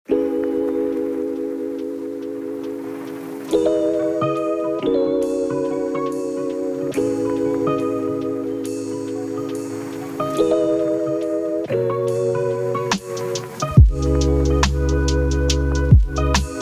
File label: Lofi Hip Hop, Lofi Hip-Hop Beats